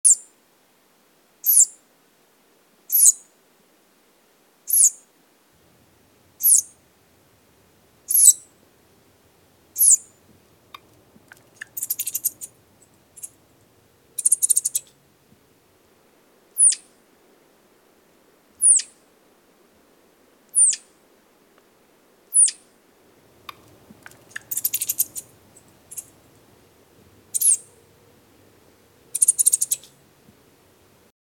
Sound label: flying squirrel